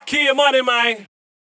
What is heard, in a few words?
VOX